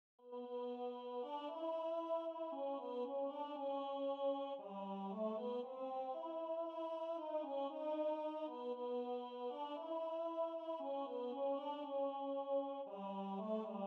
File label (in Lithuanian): TENOR; Šv Augustino mišios